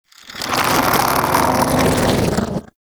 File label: Bug Vocal Idle; Characters; Warframe; Hunting Critters; InfKDriveBug